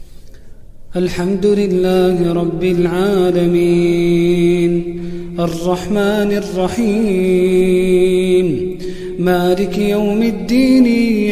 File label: Quran